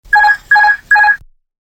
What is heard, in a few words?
Airbus Autopilot Off